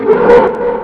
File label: entweihen damage